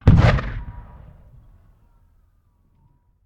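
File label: Cannon Black Powder; Sound Ideas; Guns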